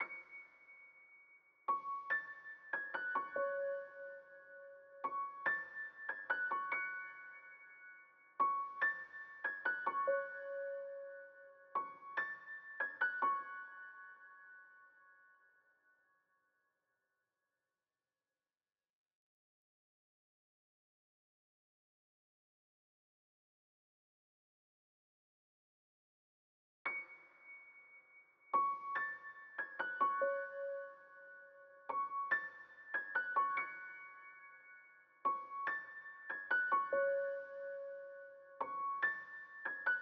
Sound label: 143 BPM F# Min Piano; Melody; Trap; Loop Stems; Cymatics; 2022 Melody Collection; Streets